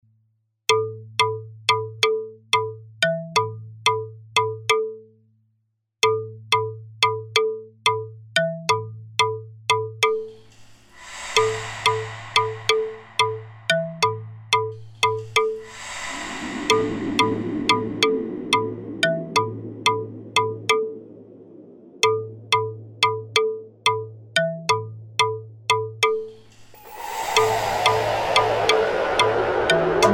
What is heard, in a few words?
BEATS